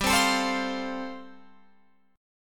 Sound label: G 7th Suspended 2nd Suspended 4th, Guitar Open F; G7sus2sus4 strummed